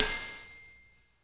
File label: BELL; The SoundBlaster Multi Media Experience vol 4